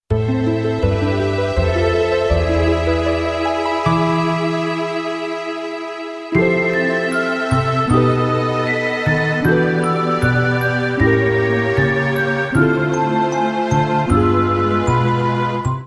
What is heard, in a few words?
game over theme; final fantasy vii soundtrack